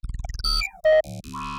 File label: Phase Plantz, fills